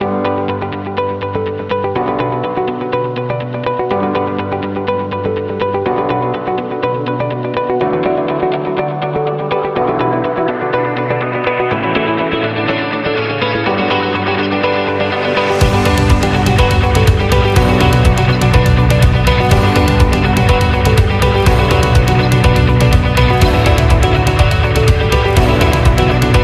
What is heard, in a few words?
Rock Inspirational; cooking show